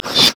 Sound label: wraith effort; LoL; monsters